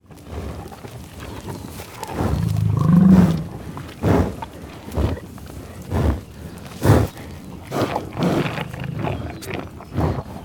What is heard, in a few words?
animals buffalos eating grunting growling sniffing tall grass prairie oklahoma; usa; felix blume